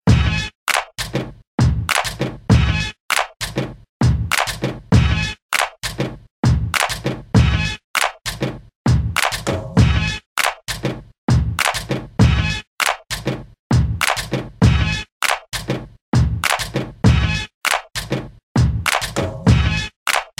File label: clap; Florida